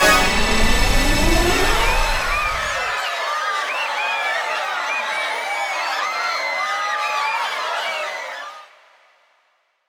Level Complete; Boulder Dash 30th Anniversary

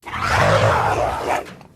sound Dragon Boss Roar; freedom fall

